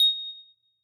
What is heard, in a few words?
Wind Chimes Single; HauntedBloodlines; SFX Producer